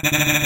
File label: laugh; spamton